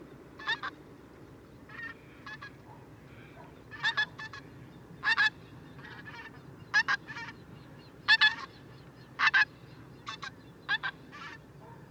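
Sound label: tundra bean goose rossicus flight call honk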